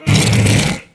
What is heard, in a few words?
amazon statue attack; DAoC